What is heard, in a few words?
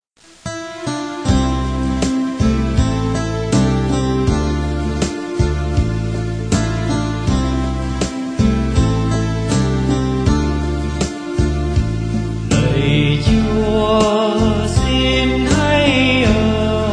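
soprano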